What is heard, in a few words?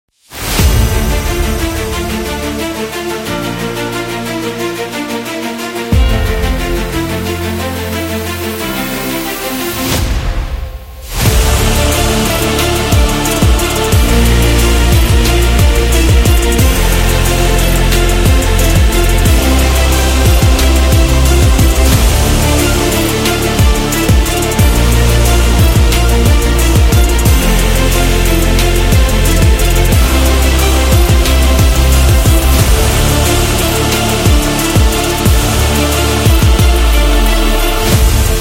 Epic Trap